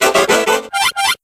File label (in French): Cri Chrysapile